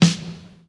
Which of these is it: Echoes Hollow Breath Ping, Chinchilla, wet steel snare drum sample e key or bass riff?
wet steel snare drum sample e key